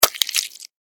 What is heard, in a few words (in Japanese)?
クリーチャーが食べる音